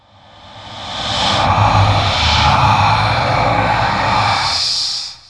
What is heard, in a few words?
incant; warlock